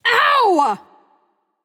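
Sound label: ouch, female; smite; voicepack; hera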